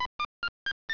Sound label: IR Connected; braillenote; mpower; Keysoft